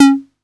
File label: 808, CONGA, Electronic; Wizoo - Platinum 24 Electronic; CONGA MENU